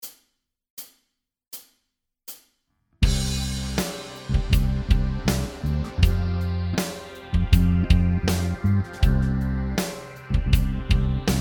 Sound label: Backing Track